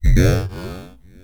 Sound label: Access Virus B; Synthworx